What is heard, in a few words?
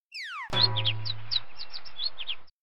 lives over fall birds chirpping